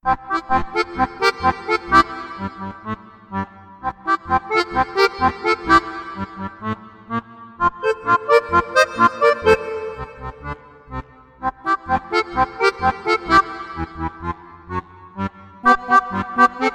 Walking Rock Duet

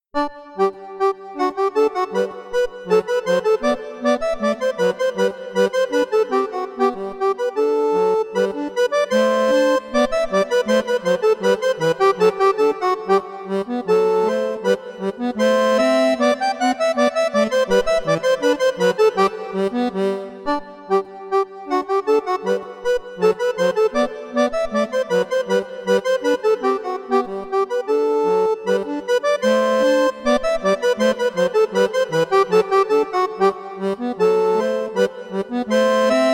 Bourrée

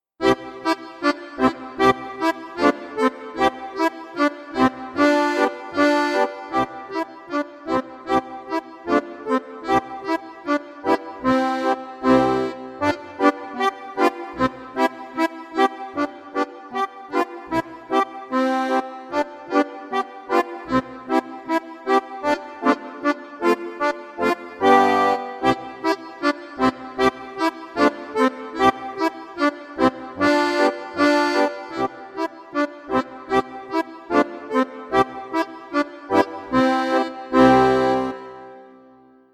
Duet

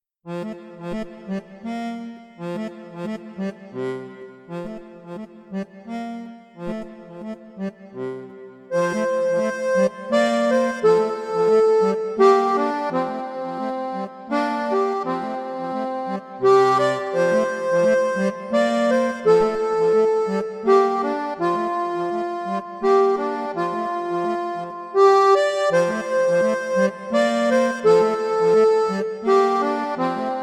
Jazz Waltzing

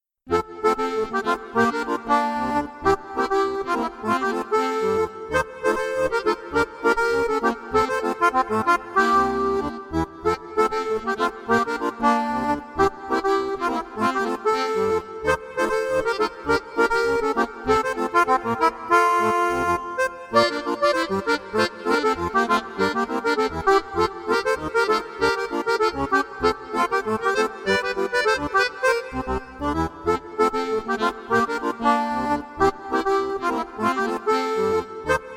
Calypso Time Duet

